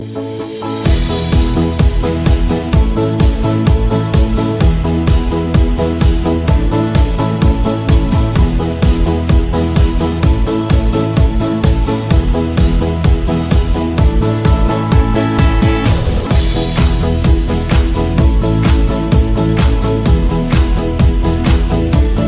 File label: best cool beat; hip hop